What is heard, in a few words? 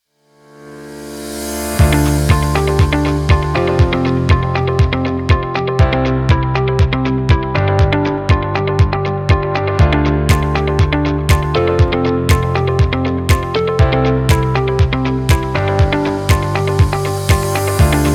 Motivational Momentum Upbeat Motivational Corporate Technology Short